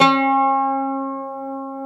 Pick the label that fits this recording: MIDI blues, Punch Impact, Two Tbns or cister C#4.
cister C#4